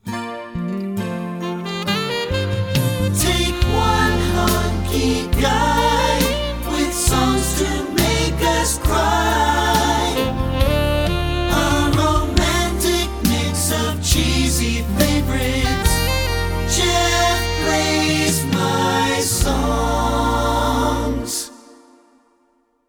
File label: Jinglebells